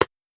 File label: clap